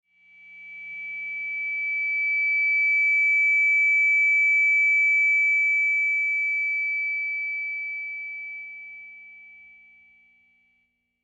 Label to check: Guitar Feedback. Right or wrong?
Right